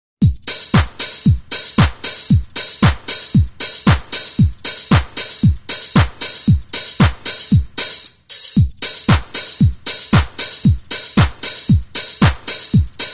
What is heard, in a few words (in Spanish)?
Bailando Salsa Picante Mix